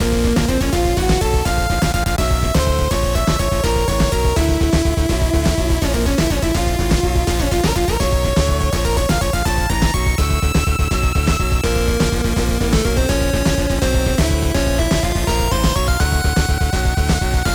Boss Fight Shadow Loop; bat boy; ps4 ps5 switch windows xbox one xbox series xs

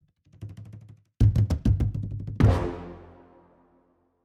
Caves Suspense end

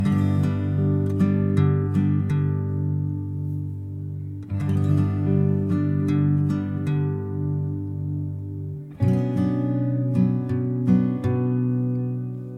Ambient Guitar Stem; ps4 ps5 switch windows xbox one xbox series xs

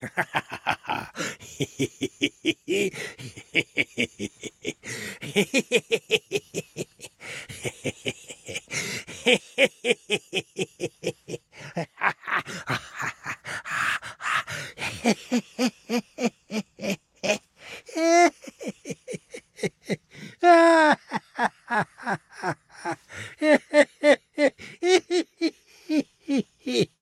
Attack grunt; We Happy Few

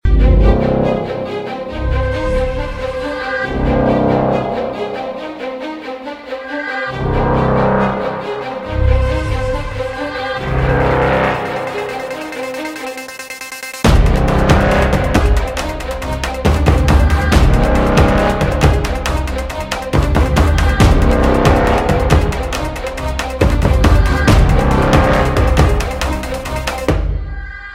Music Wait; Gulag Music Wait

